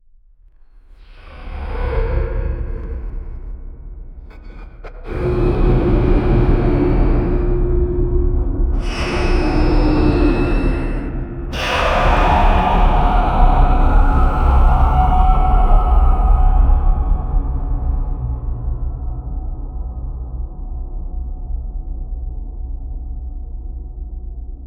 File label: deep guttural monster, bone crack